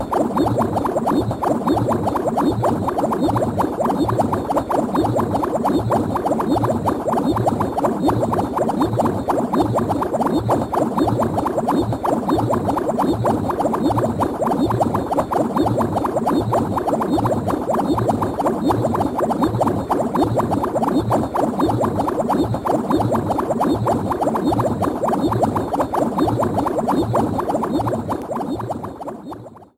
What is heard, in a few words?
Bubbles; hook data east pinball soundtrack